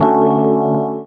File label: Chords Tones; Equipped Music; Loungin House